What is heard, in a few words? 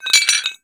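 ceramic plate movement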